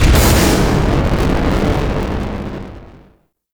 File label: Rail Jack Ship Banking B; Warframe; Vehicles